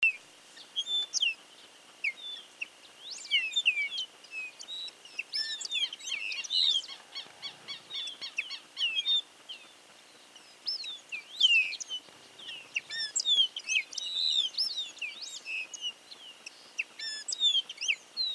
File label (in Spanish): voz tordo músico, aves